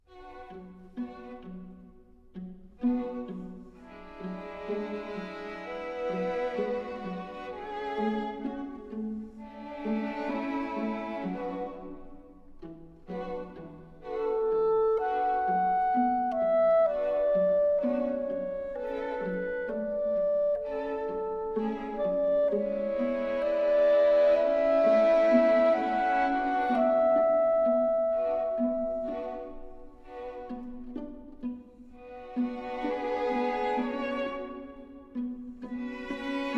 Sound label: No Vocals; Assassin's Creed Syndicate